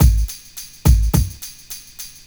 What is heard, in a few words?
8TEL B 106, loop, BPM; 100-114 BPM